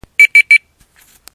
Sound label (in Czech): budik pipani